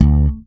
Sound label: SLP POP D, PEDULLA BASS; Masterbits CLIMAX 7 - Bass